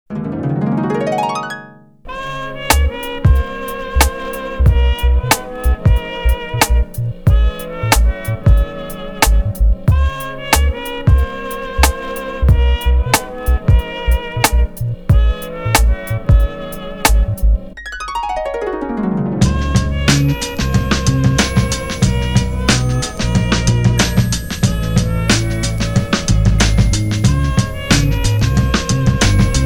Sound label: instrumental